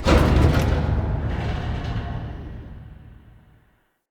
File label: Pollution Tower Upper Door Start A